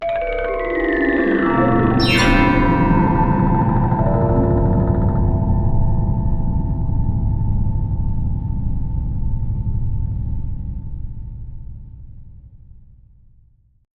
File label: MUS BOX ZING; eShop